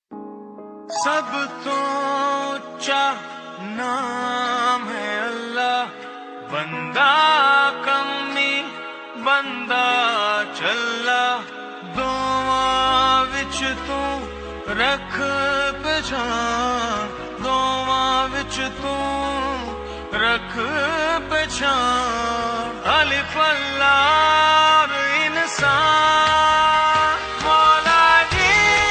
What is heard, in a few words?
Ost ringtone